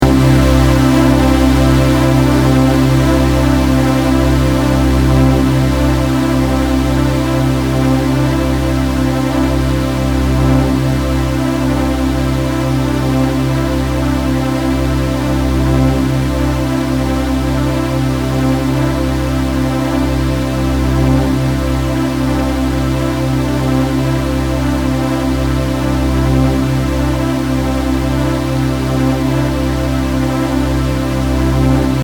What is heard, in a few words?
Reason Maelstrom, Dry; mutator demos